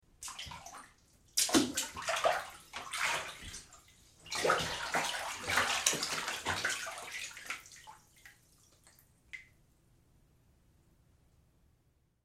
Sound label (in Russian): zvuki shagov cheloveka po vode